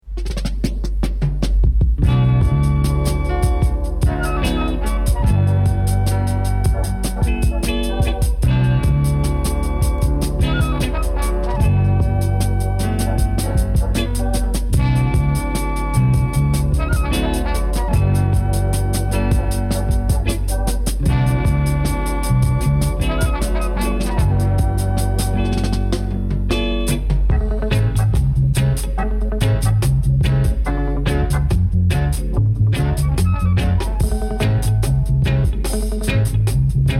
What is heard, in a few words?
Flute Instrumental